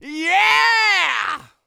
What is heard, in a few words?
YELL, MALE HUMAN; ILIO; Vocal Planet